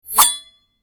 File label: metal whoosh hit